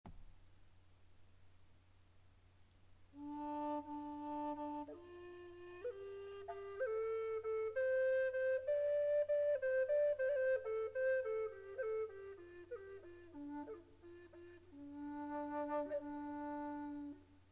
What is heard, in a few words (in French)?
flute triple RE 440, triple flute amerindienne